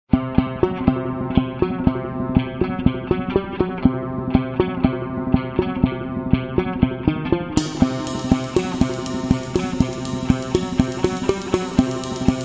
Agressive Rock

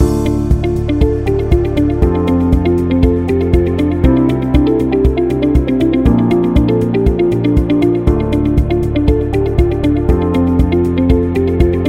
Real Estate Corporate Loop

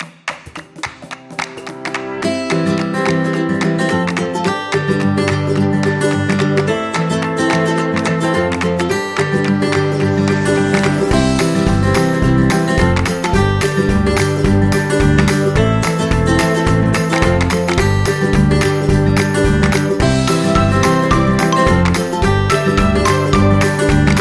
Acoustic Fun, happy music

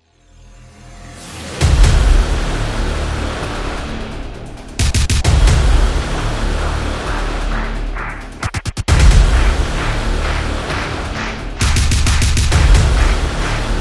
Network, stomps and claps

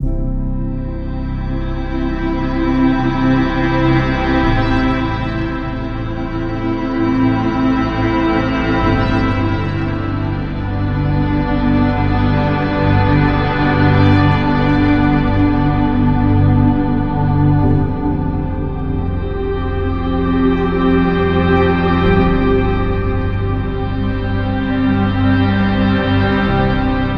Meditation Atmosphere, 528 hz

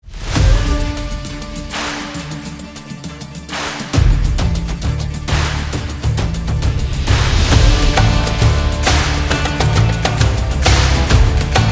Arabic Funk; ramadan 2025

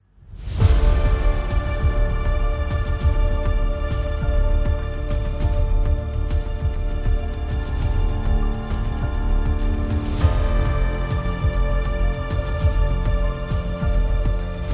Epic Drama

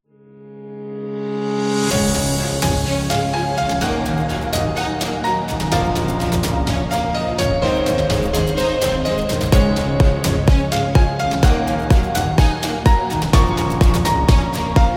Epic Motivation; corporate music vol 22

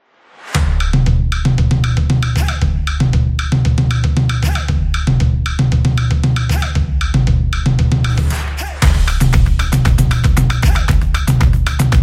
Rhythm

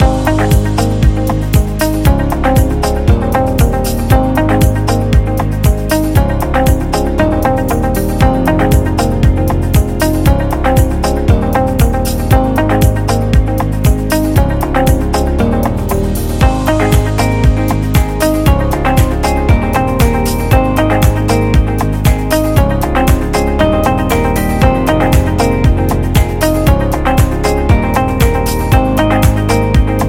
Corporate Positive Loop; corporate interview

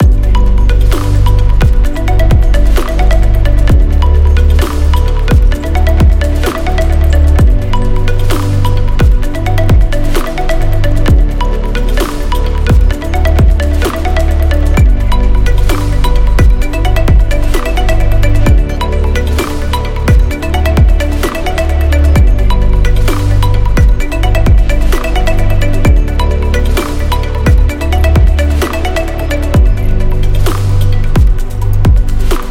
Technology Loop Hi Tech

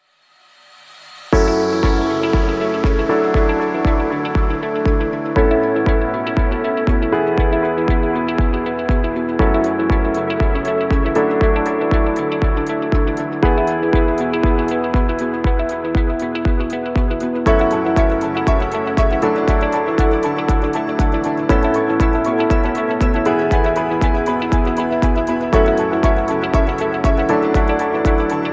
Luxury Music; positive vibe

